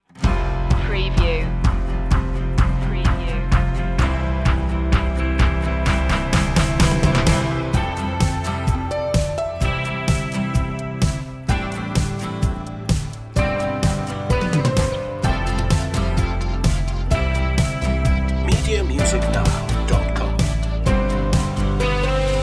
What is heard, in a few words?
keep love around no vocals